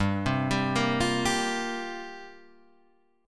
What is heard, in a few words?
F Suspended 2nd Suspended 4th G